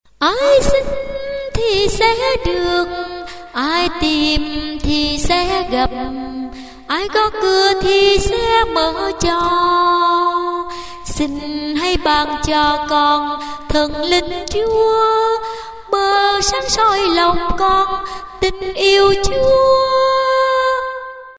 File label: sop alto